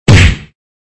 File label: High Impact; Extra Lives